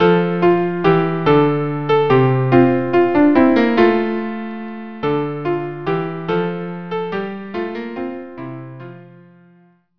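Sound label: violin viola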